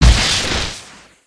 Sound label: baby spider hit; creatures mechanical